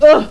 OOF; Carmageddon

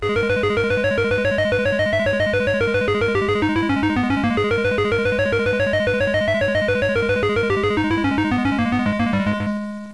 tune; Games.Rick Dangerous

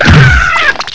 big hit; Carmageddon

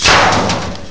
METAL; Games.Carmageddon

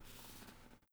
slide; Chess Giants; Sketch

